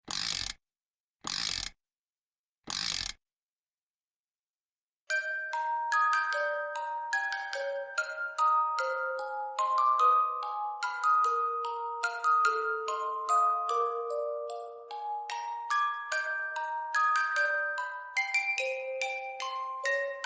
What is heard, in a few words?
Lullaby; Remix Wars; Round V